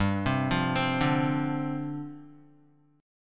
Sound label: G 7th Suspended 4th G down Guitar; G7sus4 arpeggiated